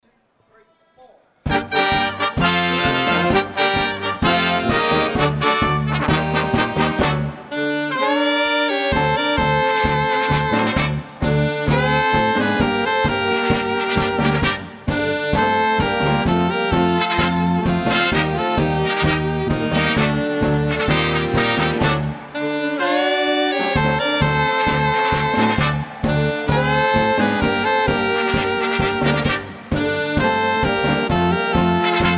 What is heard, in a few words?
tango, ballroom; blackpool2015; prof ballroom